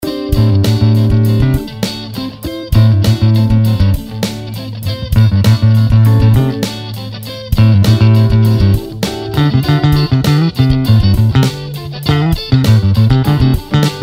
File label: Funk In A 100 Solo Bass; Blues Solos